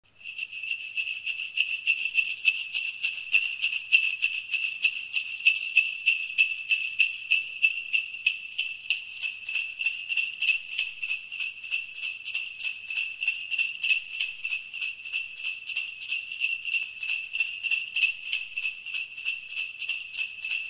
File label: sled